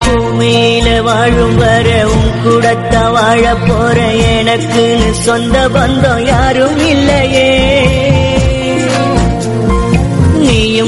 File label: Song Tamil Song; ringtone